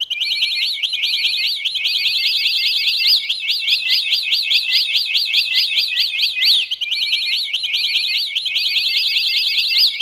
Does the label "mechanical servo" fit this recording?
No